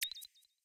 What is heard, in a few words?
song ping; osu; SongSelect